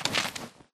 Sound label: spectre zombie drag grunt; Titanfall 2